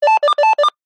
technology CB radio call ring tone

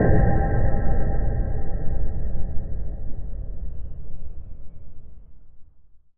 Impact Boom Ping; bottlerocket

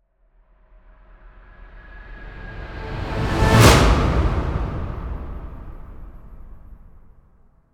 Swell To Impact Orchestral no piano shovel; bottlerocket

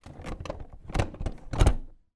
office chair back adjust

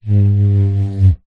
household squeegee wipe clean glass window

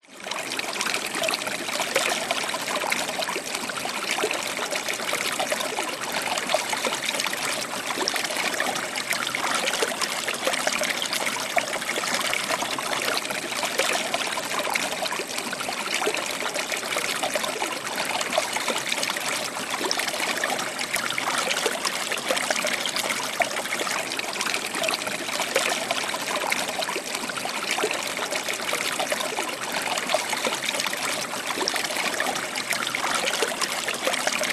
nature creek narrow rock passage water flow; zapsplat

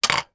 electronic game plastic body part put in cavity; operation

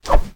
medieval sabre swing; still north media

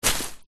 footstep single long grass; foley; zapsplat

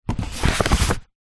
foley bottle wine place into paper gift bag

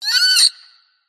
squeaky metal Designed Alien Bird; PMSFX

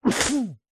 human male man sneeze into cloth or arm muffled; zapsplat

